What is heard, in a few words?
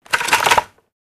Gun Clip Rammed Home, handgun